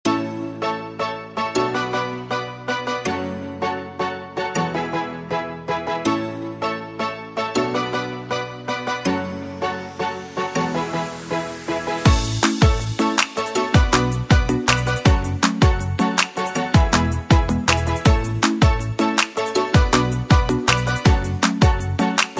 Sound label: rock pop